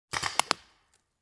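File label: Paintball Gun Impact; Sound Ideas; Guns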